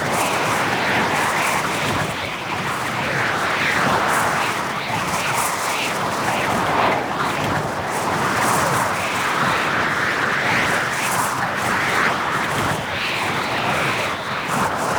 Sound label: Wall Climb Dodge Loop; Warframe; Oraxia